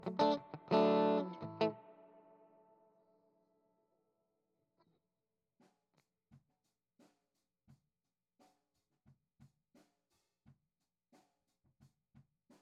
GTR

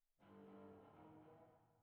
Tom M

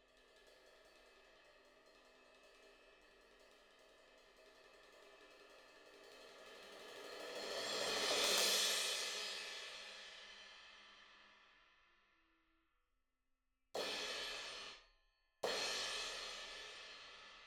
Cymbal

